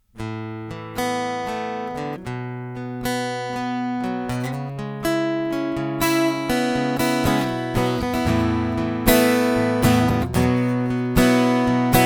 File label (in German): gitarre mischpult